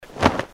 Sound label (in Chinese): 换表情